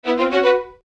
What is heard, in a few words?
Chime Strings Melody; Blastwave